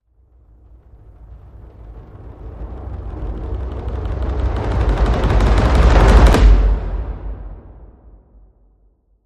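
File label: Percussion Crescendo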